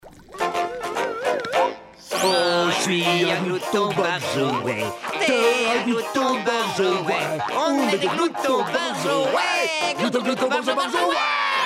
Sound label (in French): chanson; bob leponge; gloutons barjots